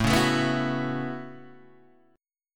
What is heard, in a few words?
A 6th Add 9th A down Guitar DADGAD